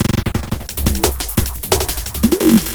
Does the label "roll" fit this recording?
No